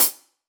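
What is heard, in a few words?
Sab Closed Hat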